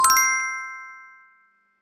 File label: chimes